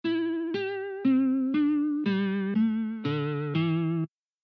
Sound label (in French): vibrato, guitare